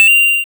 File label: pickup; zombie shooter